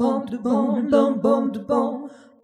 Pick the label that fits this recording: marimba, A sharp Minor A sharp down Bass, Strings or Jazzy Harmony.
Jazzy Harmony